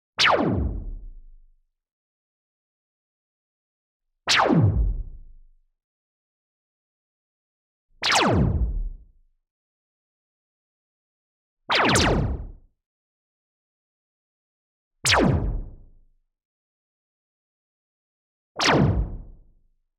Retro Laser Zap Synth; Funky Audio Sonic Spices

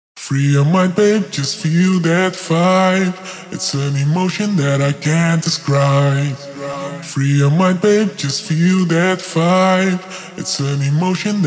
Vocal 140 Bpm Hardcore

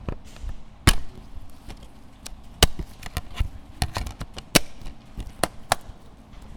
Open Close Vhs

